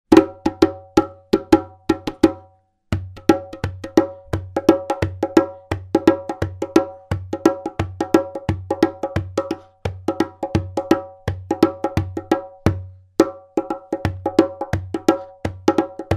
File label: djembe